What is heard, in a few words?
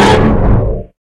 scare; itsteals; legs; slender